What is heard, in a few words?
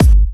Hard Kick; Vengeance Samples